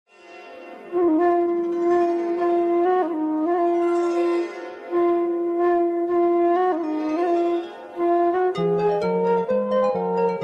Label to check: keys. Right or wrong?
Wrong